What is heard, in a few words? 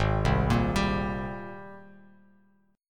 G sharp Major 9th G sharp, Bass Standard; G#M9 arpeggiated; JGuitar